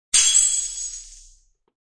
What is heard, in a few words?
Small Plate Breaks, Small plate breaks and shatters on hard floor; Kitchen; Household